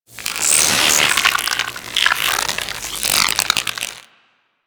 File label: shock swarm projectile random; Warframe; Infested; Shock Swarm Rifle